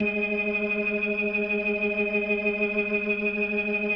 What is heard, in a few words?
G#3F, B3, Vintage Keyboards